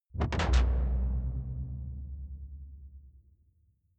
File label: sci-fi alien splash impact dark; swooshes-swishes